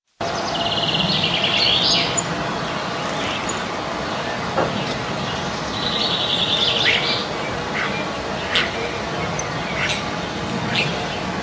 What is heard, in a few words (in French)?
Roitelet